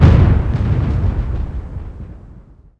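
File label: thunder far; The Elder Scrolls III - Morrowind; Morrowind GOTY GOG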